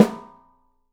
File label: OPEN PICOLO, 3D Drums